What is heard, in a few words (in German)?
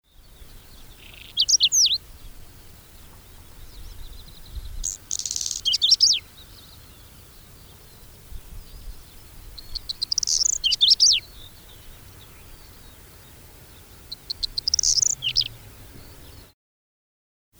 braunkehlchen